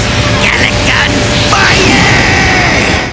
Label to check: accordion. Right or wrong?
Wrong